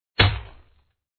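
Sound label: occultist attack contact middle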